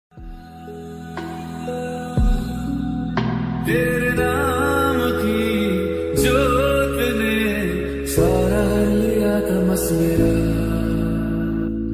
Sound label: mahadev ringtone bholenath ringtone mahakal ringtone lofi ringtone